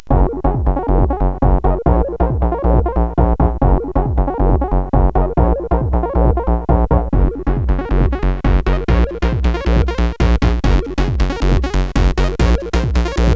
House, sid CSG8580R5; hvsc

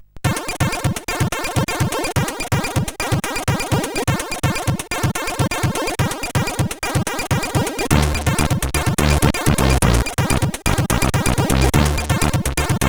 Bossa ntro, sid CSG8580R5

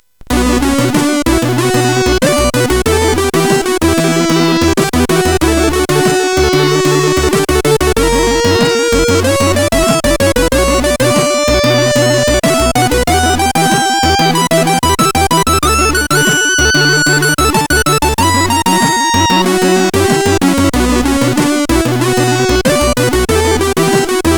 DISCO, CSG8580

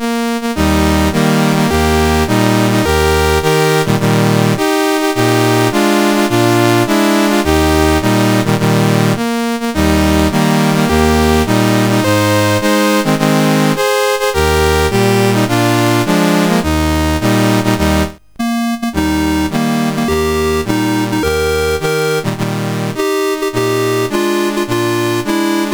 Costa Rica, National Anthems; CSG8580R5; Dave Marquis

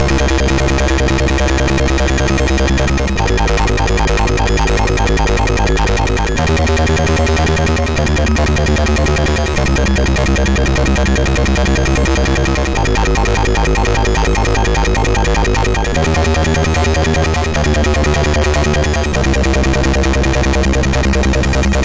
Intro Tune, MOS6581; MOS6581R3; Hotline

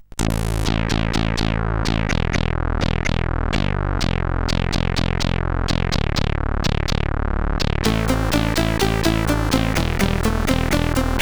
Music, CSG8580R5; Music One

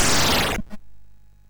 sid MOS6581R2, GAMES; Catalypse

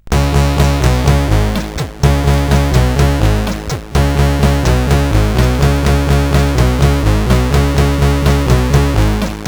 Music Imitation sid CSG8580R5

